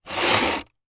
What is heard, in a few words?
Foley Plastic Scrape On Concrete